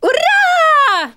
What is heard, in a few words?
Emote Cheer; the princess and the frog; Twitch Skijump